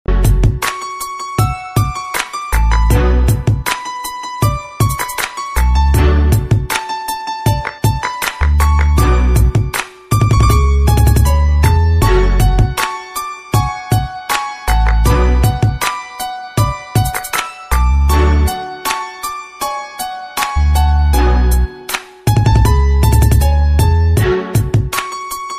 Cool Instrumental

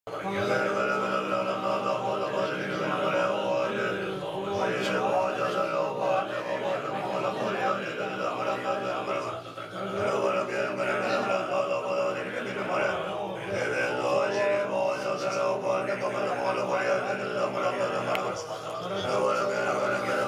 Tibetan Chanting

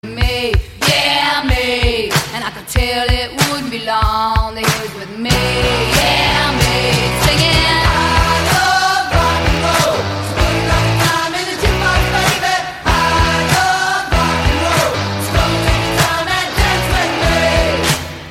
rock and roll

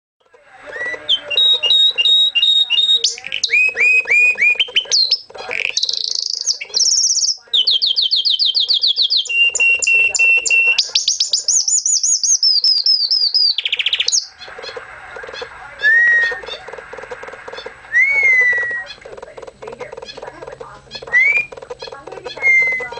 Canary Song

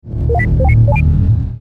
Protoss Shuttle

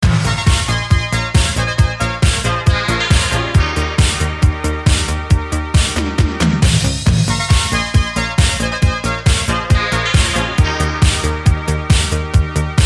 80s Beat

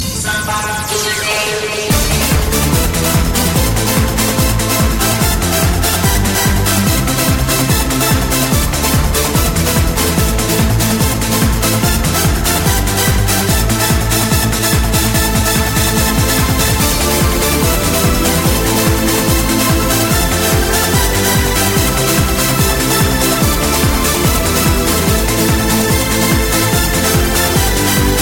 Trance

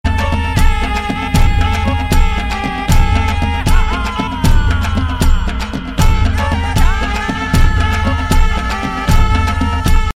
Powerful Music

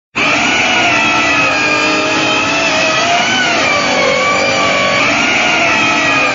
Saw

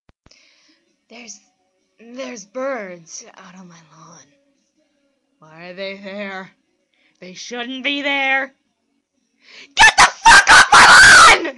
Birds

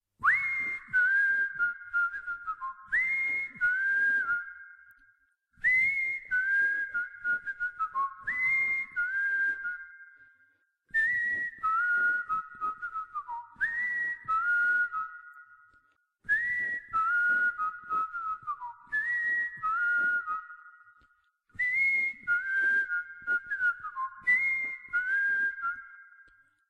Cool Bird Whistle

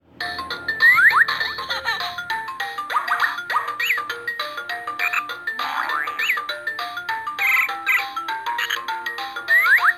Kid Tone

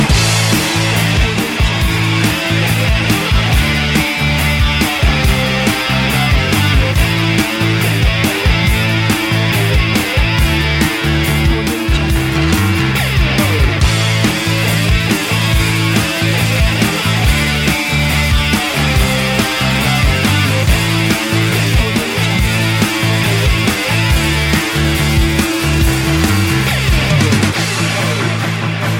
Rugby Rock Anthem

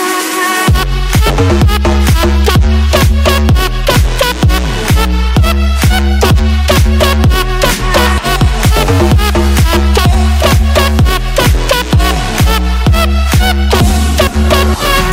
Electric Elephant

